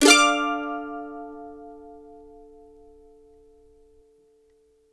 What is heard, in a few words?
CEE CHORDS DM, Blues Guitar Samples